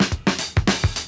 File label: Drum loops, Boombap